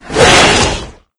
attack, maggot; doom3

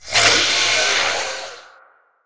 spider hail

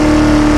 shelby mid

